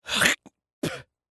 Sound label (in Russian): plevok